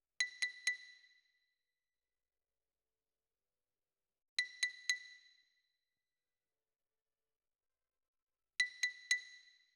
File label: Xylo Close